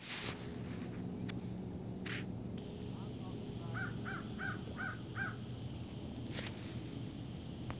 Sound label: Little Raven, Corvus mellori; Aves